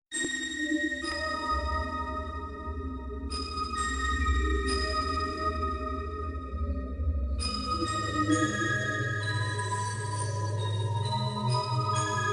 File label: Soft Msg